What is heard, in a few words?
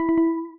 Alert; CMLeonOS; Gui